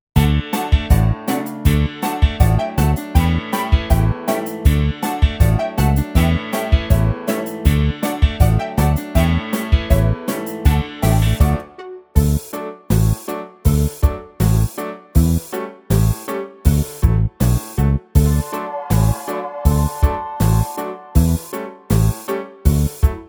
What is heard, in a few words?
Karaoke